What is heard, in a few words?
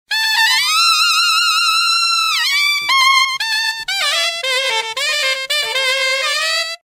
delay, sax